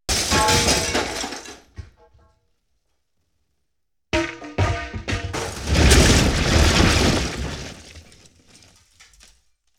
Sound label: Explosion, 5.1 Surround; Sony Pictures Sound Effects Series